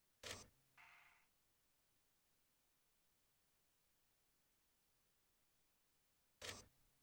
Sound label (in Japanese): ヘッドホンボリューム10 フィルタ発生; Gemini